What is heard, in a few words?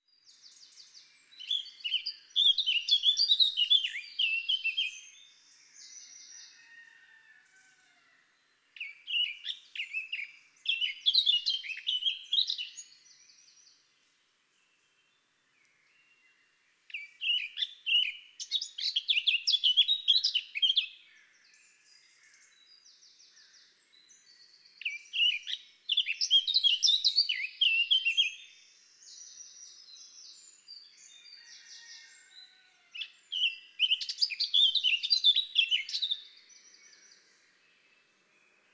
Sylvia atricapilla; SETE